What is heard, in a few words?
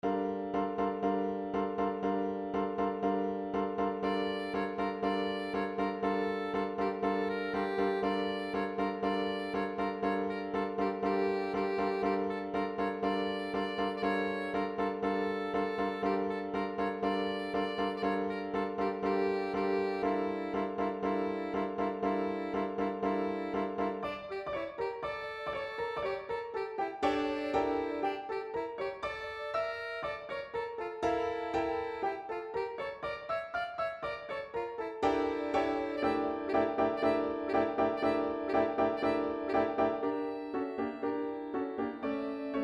Arabian Folk Melodies, MIDI